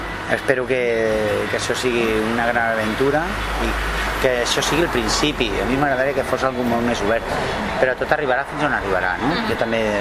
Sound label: groove